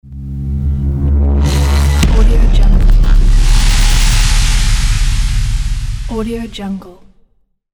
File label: hitech logo; paul-woln